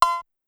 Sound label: Cowbell 808, Pitched Up; Clavia DDrum-AT